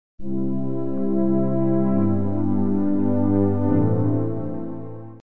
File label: Examples of I-V-vi chords